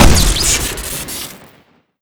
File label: thermite shoot crit; weapons